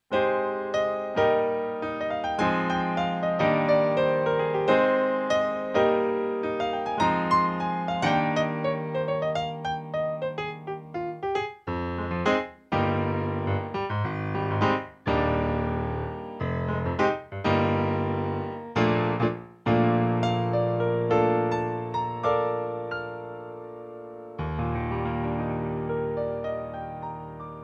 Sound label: Kawai MP11 Pop Piano